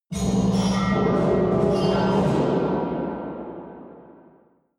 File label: metal rumble